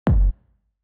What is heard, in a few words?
ambient